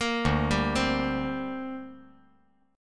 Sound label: D 7th Suspended 4th Sharp 5th down, chord